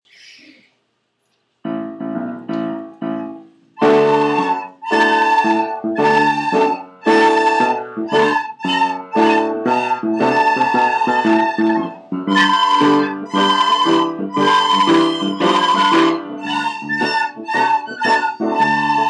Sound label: Blues